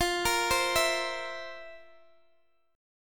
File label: F Major 7th Suspended 4th, Guitar, Standard; FM7sus4; x,x,15,15,13,12; arpeggiated; capo=2